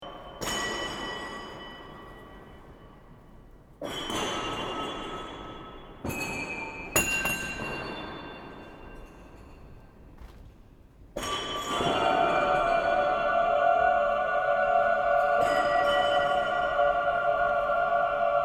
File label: Beams Brass Chor Metal; Curran